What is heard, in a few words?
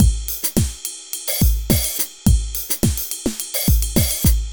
Beat, Drums & Percussion; Electrocution; Beats